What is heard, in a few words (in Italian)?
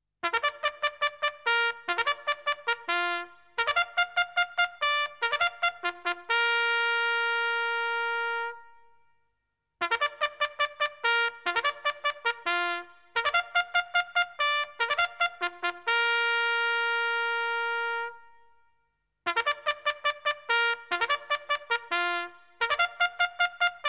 suonerie tromba militare